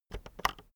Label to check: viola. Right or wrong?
Wrong